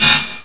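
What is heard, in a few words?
buzz ricochet, weapons; quake2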